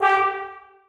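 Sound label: trombones staccato g2; Sonatina Symphonic Orchestra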